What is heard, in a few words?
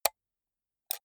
computer mouse right click slow hard